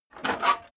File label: metal plate armor handle